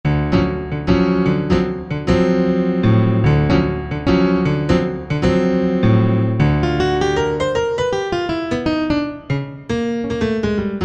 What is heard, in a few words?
Pf Jazz Grand, Yamaha EX5R; Factory presets